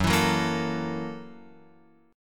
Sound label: Gb 7th Sharp 9th Gb Guitar Open F; strummed; Gb7#9; JGuitar